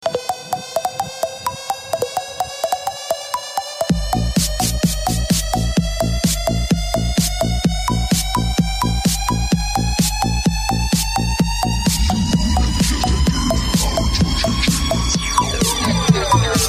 Electro Bong Mix

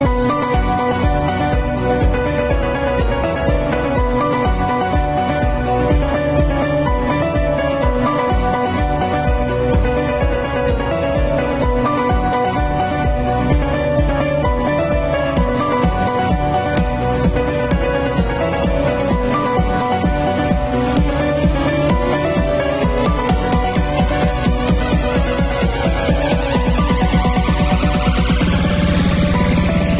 Badinerie; Nokia

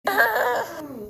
Ahhaa

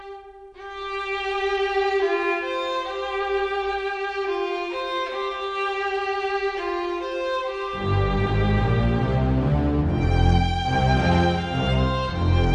Violin; Castlevania

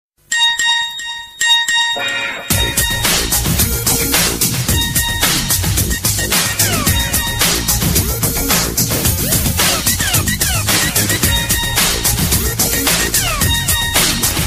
Nokia Mix

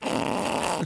Chip Laugh

